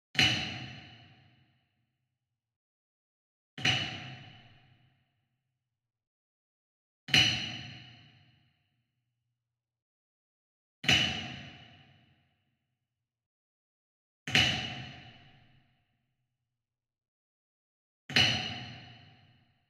Wood Percussion Wood Block Traditional Resonant Flam Far; boom library; Cinematic Strikes Construction Kit